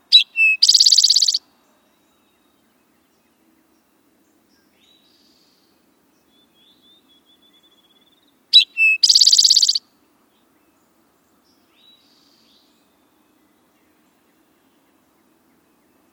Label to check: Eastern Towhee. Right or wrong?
Right